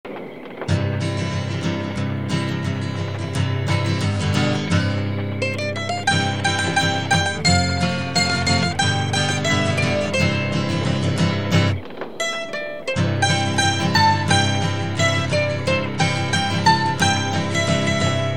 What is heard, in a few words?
Viens dans la foret (instruments only); Grade 4 French